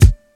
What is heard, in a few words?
punchy hip hop kick drum one shot F# key